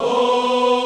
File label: VOX